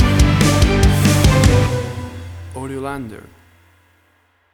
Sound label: Big Stadium Classical Rock Crossover Stinger